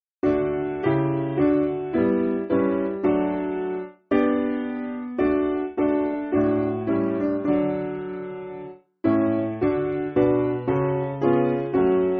Piano, church music

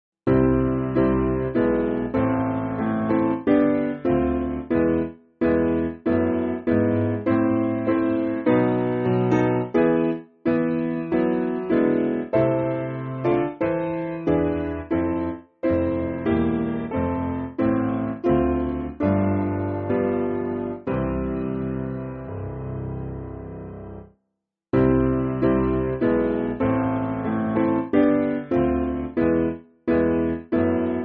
Keston, Piano; I Am Not Skilled

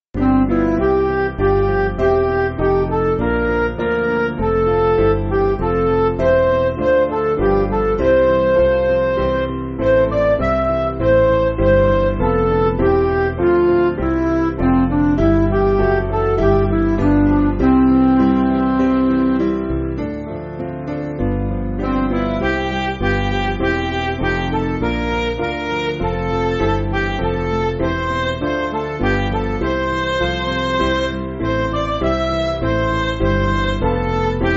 Midi

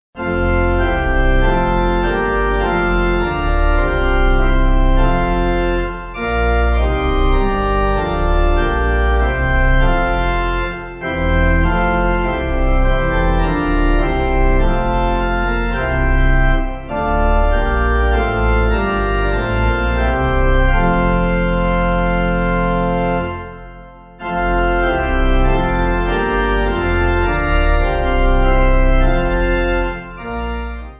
May The Grace, Pipe; Organ; 2/F; 8.7.8.7

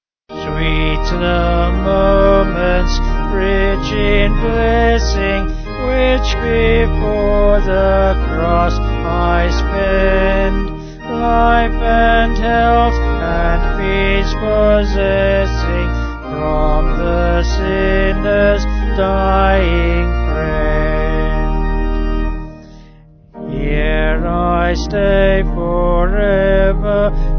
Sweet The Moments Vocals Organ; Batty

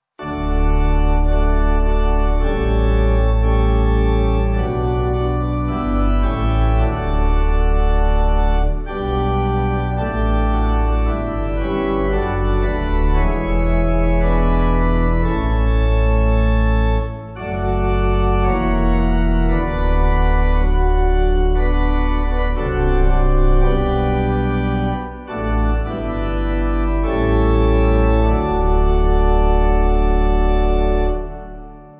The Night Is Come, Blessed Rest, Pipe

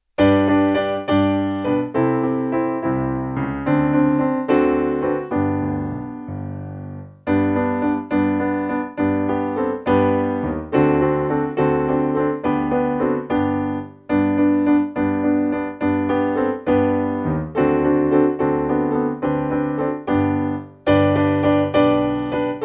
I Am So Glad Piano

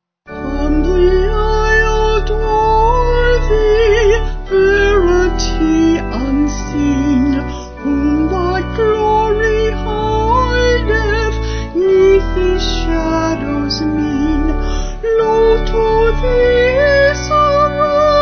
Humbly I Adore Adoro Devote Vocal Organ

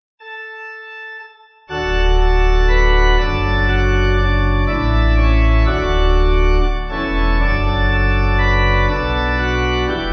Gloria In Excelsis Pipe

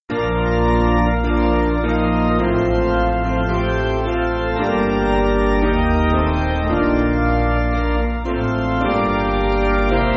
Piano, church music

